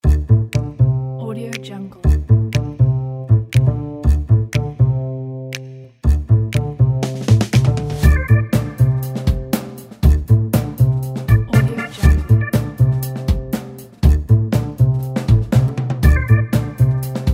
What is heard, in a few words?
the upbeat jazz